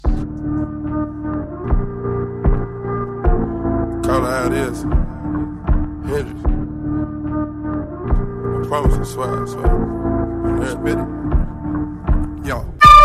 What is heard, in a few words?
recorder